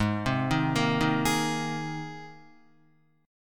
Ab Major Add 9th down Guitar; Abadd9 arpeggiated; JGuitar

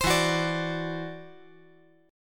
E 7th Suspended 2nd Sharp 5th, E, down

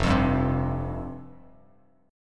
F Suspended 2nd F Bass Standard; Fsus2 strummed; JGuitar

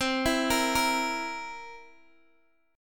C 7th C, Guitar DADGAD, chord

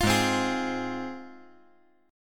G sharp 6th; strummed; JGuitar

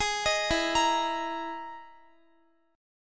E-Major Sharp 11th E, Guitar; EM#11 arpeggiated

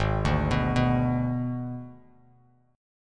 Ab Diminished Ab, Bass Standard; Abdim arpeggiated; JGuitar

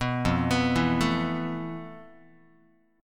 F Major 7th Flat 5th, Guitar; arpeggiated; JGuitar

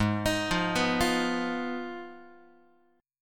G sharp Minor 6th G sharp down Guitar; G#m6 arpeggiated; x,4,6,3,3,4; JGuitar

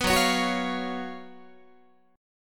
Gb Major 7th Suspended 4th Gb Guitar

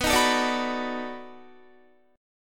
B 7th Sharp 9th B, Guitar; B7#9 strummed; 4F,4A,5C,5G,5C,6E; chord shape; JGuitar

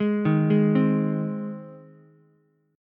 Ab Major Ab down Ukulele; arpeggiated; JGuitar

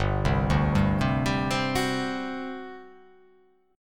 G Major 7th Suspended 4th, G, down; GM7sus4 arpeggiated

